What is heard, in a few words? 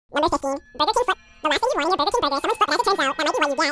foot